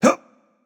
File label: Grunt Jump; smite; raijin